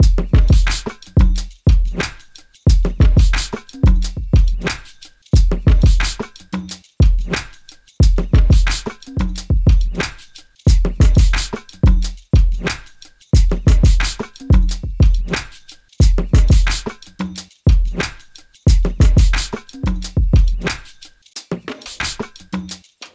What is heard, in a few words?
Various Drum Loop - 90 BPM; Cymatics; Orchid Sample Pack